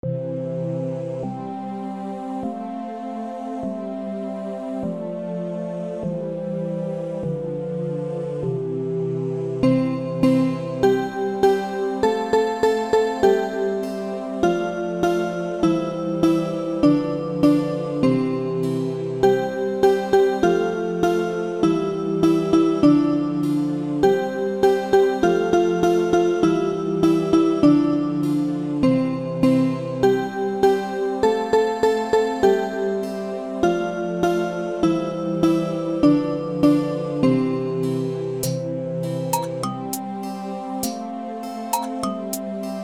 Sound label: Baa Baa Black Sheep Instrumental; for kids